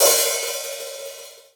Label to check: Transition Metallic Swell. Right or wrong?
Wrong